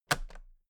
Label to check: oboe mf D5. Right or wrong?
Wrong